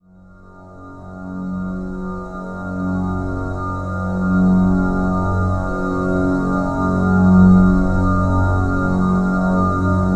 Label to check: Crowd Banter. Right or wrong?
Wrong